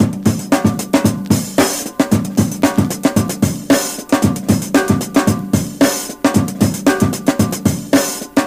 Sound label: Drum loops, Funky